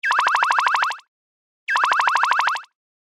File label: Robo